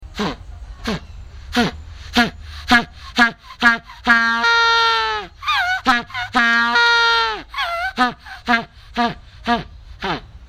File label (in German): schrei